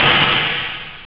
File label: hihat, drums; OctaMED SoundStudio V1